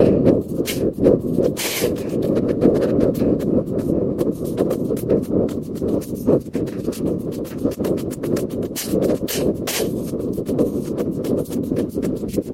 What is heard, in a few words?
drums summed